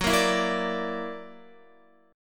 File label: Gb Augmented 7th Gb down Guitar 4F,4A,5C,5G,5C,6E; Gb+7 strummed